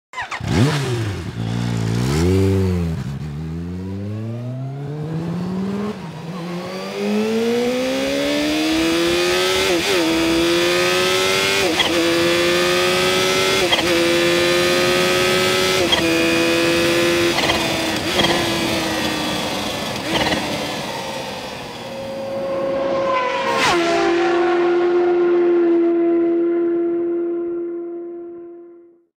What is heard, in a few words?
Ninja H2 Mysterious Sound